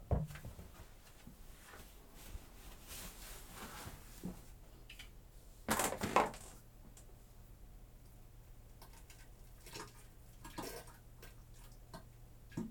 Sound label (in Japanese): 放屁放尿脱糞音声集(きばっている音も含めたロングバージョン)